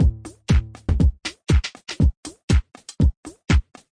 120 understated latin house, drum loops and breaks